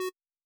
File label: sci-fi button beep; Tabletop Simulator